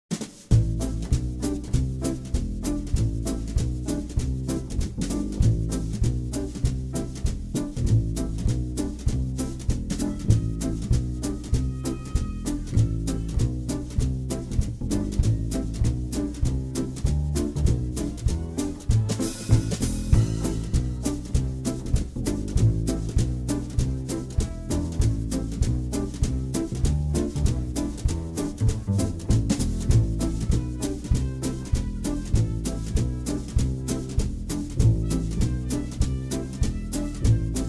Top Hat And Tails Groove; the movies 2005

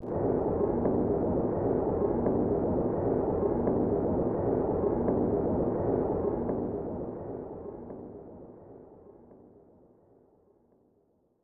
Anxious Crowd; super bases loaded 3; snes

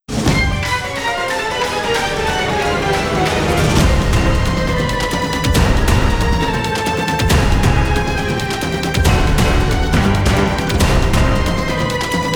stun exit intense

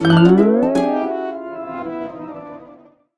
Level Fail Jingle; SpongeBob Krusty Cook-Off; android

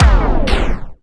DJ Disco Stop

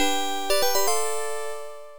Token Sound; Avenger Bird

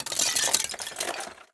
ice shatter glass

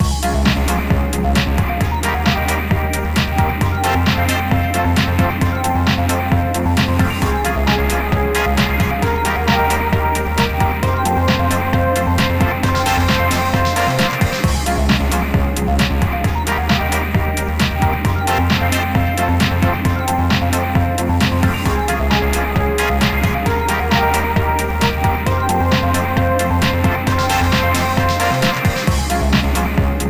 Goal Theme; super mario strikers gamecube

